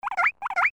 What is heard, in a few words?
Marmot; kendallyards